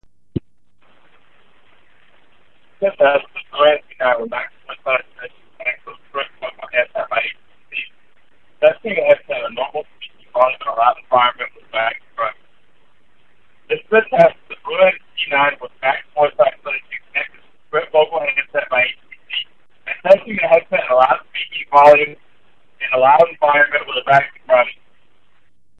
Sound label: Vacuum; BlueAntZ9 max; Mogul